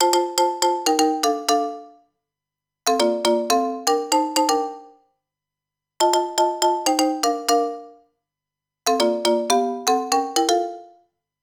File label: cartoonish; Smartisan OS 6.0.0; ringtones